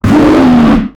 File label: boss alert roar, cybernetic